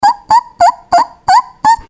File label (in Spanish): ritmos